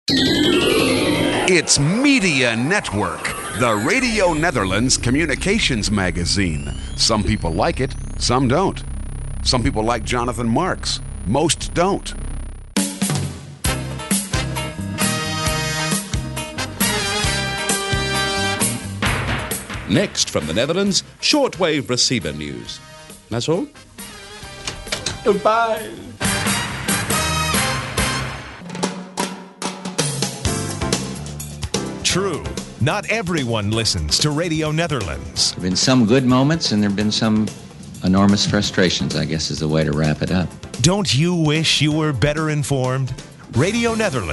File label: jingles; Media Network jingles Wereldomroep